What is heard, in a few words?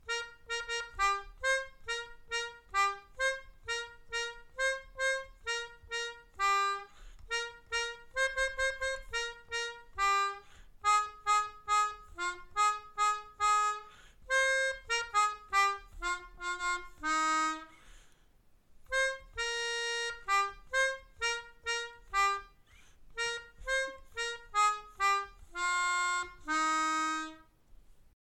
Zakdoekje leggen, Melodica